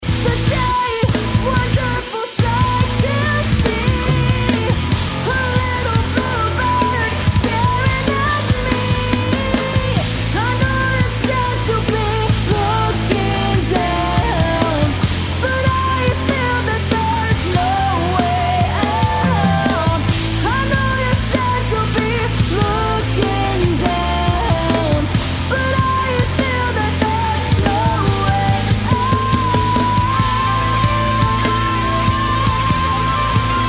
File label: Progressive Rock